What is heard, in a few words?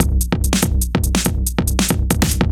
Heavy Swing 95 C, 95bpm; off the grid